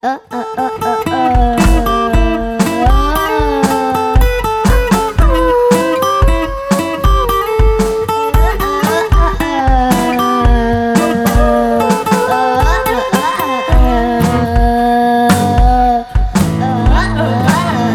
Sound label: wat ooh wat ooh whoa